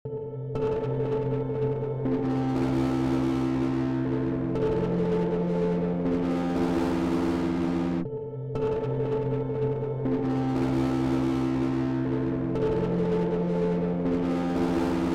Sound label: Overdrive; Shinechilla